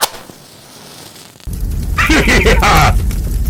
match fire heheha

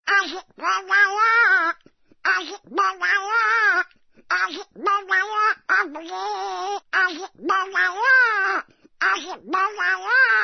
sing; donald duck

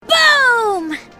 boom; meredy

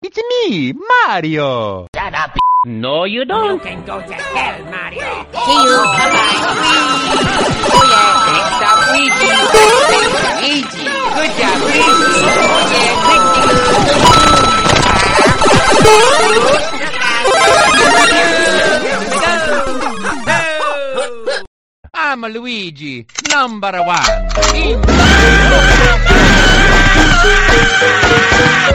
beats up and shoots; luigi

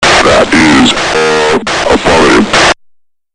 human grunt yes

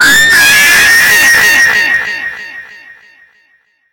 jumpscare; ortensia

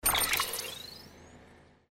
cufflinks open

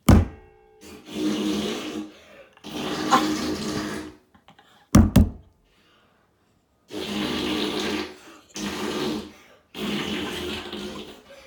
massive fart

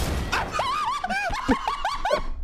laugh

